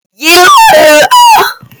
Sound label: ahhhh